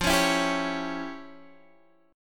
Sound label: E Minor 6th F; Em6/F; strummed